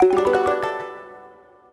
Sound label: Found Meal; Arcana of Paradise The Tower